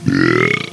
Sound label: burp